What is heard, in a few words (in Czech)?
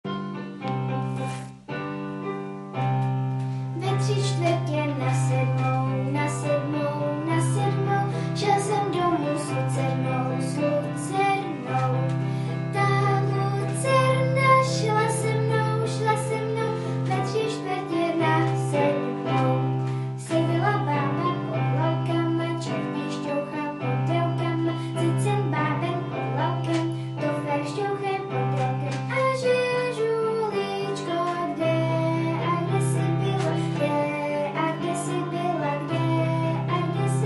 tri pisne v celku zpev